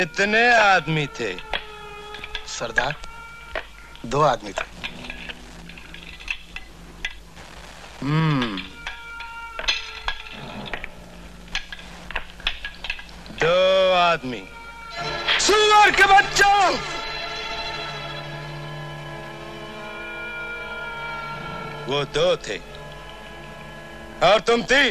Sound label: Gabber